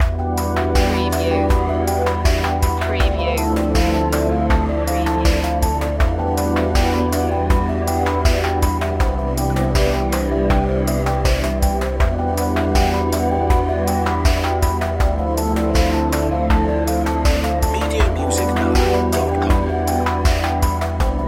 abyss loop

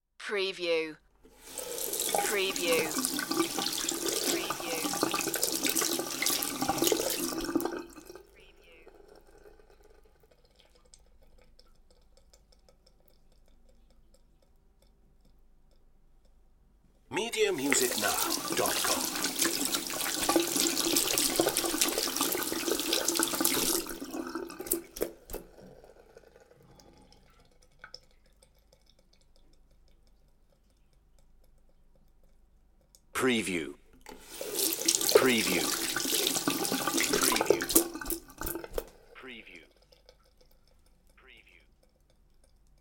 washing hands; Bathroom

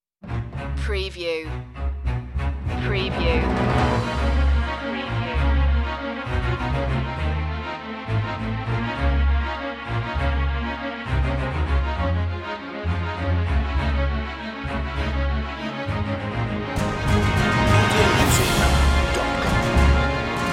no choir

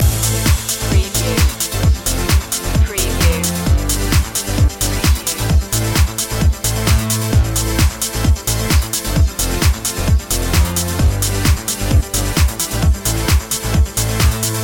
disco loop

